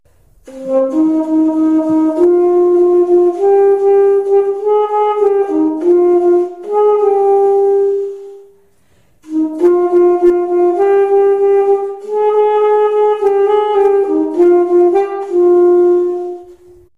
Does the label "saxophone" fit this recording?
Yes